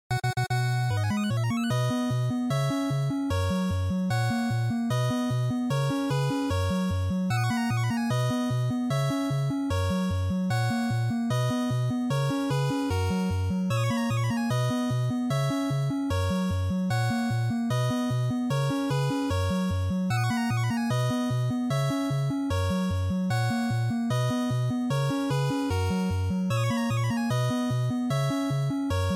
RPG Victory, bgm

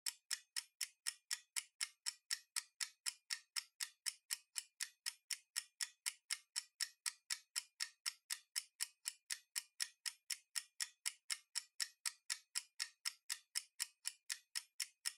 Timer Timbre 30 Seconds

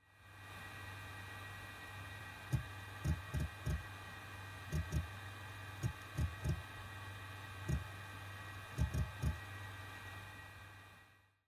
Hard Disk Drive (Operating Noise)

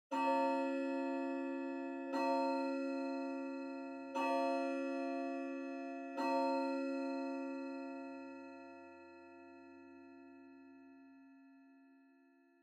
Pendulum Clock Chime Far Low

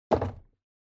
TV Hardware Put

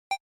SNES Racing Select

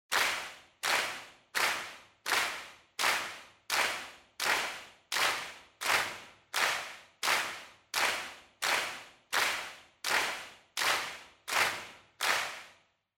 Handclap Fast

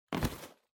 Cardboard Box Pick Up; ダンボール; 持つ; 梱包材

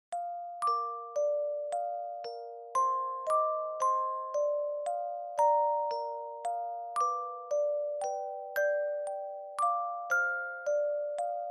Nocturne Op 9 No 2 Dry Fast; Chopin

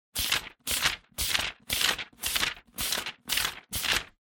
Book Flip

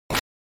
NES Fighting Swing

